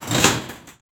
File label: trampoline; game effects